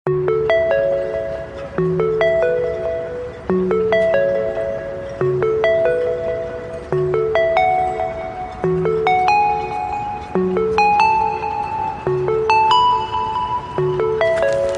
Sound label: instrumental